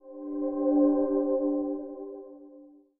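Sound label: Stylized Achievement Fx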